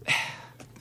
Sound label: sighing